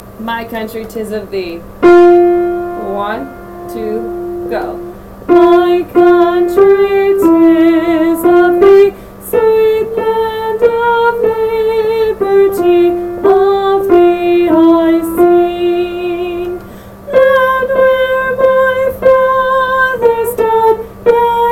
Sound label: my country tis of thee key of f